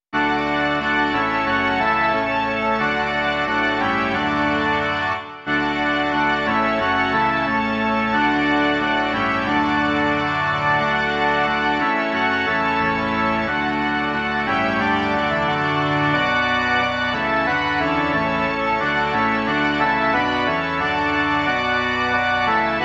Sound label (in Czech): varhany; Stavení překrásné